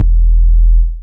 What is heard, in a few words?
JV KIT; Pro-Rec - Synthsonic; JV ANALOG2